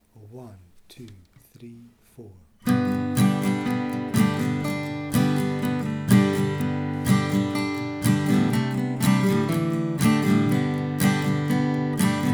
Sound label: Waiting For The Federals Accompaniment; slow session